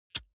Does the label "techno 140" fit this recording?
No